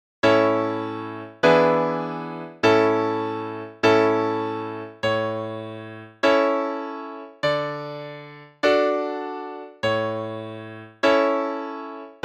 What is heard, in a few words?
bile them cabbages piano